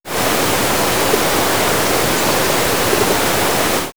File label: Nature Autumn; X-Static Goldmine